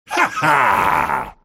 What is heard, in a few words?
dismember laugh; Dota 2; pudge